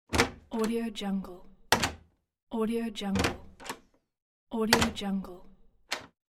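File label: office door open and close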